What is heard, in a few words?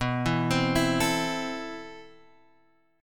A Minor B, guitar; Am/B